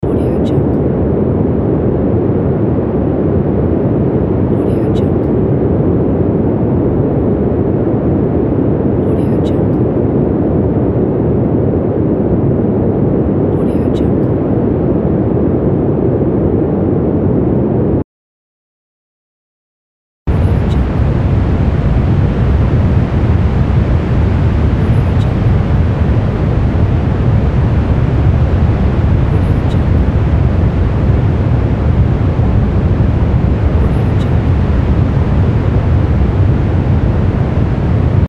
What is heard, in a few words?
airliner cabin